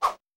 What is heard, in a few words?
whoosh; NoizBoy; Hit Kit 1